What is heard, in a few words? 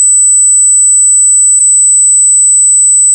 high pitch